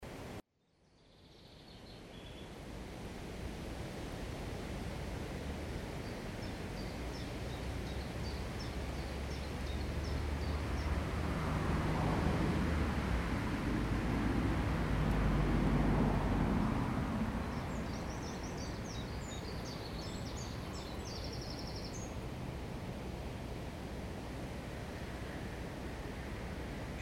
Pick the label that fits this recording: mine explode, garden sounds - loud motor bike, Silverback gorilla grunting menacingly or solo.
garden sounds - loud motor bike